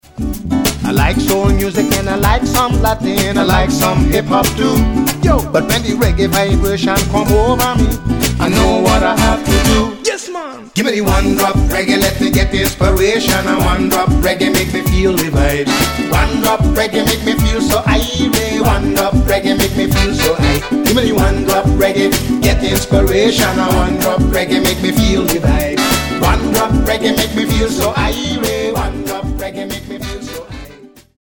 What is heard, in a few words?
One Drop Reggae